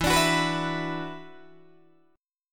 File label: E 7th Suspended 2nd Guitar DADGAD; strummed